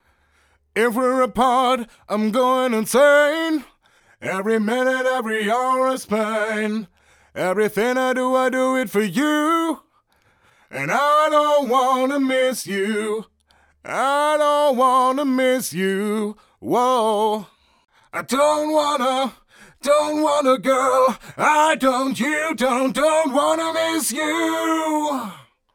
Modulationseffekte zur Stereoverbreiterung Kammfiltereffekt

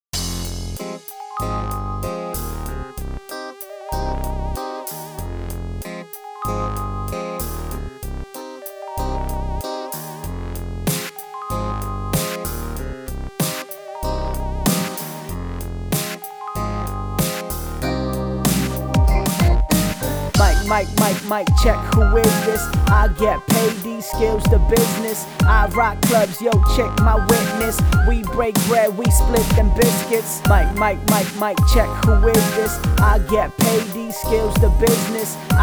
hip hop unbearbeitet; brainworx bx console

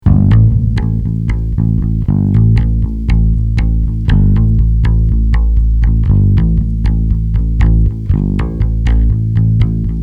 Le Bass Kanal B; two notes

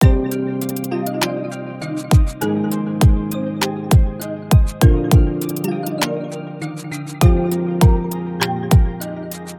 beat ohne 808; 808 Producing Guide

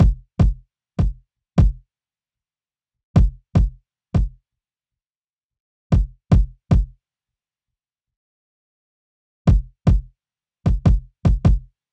kick nachher; EQ Tipps für Beats; 5 EQ Tipps für Beats

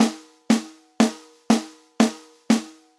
snare mit; virsyn reflect

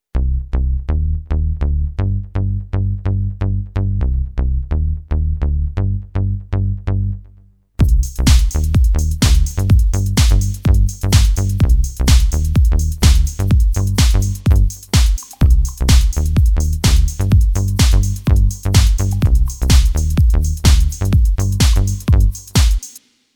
fertiger beat beats bauen synthesizer

